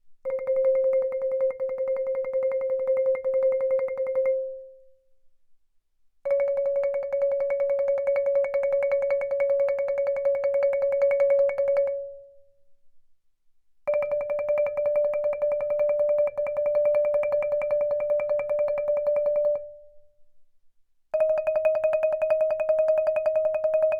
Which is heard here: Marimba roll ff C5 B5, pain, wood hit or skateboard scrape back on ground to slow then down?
Marimba roll ff C5 B5